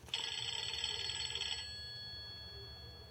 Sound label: nokia 2610 ringtone nostalgia